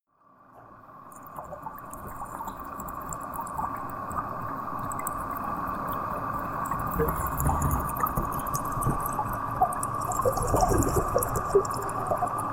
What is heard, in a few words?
water fx sizzling